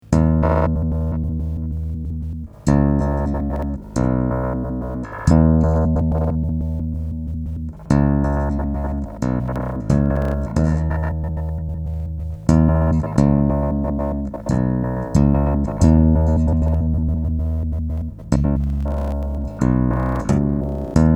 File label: Mid Grainer; SR KAOSS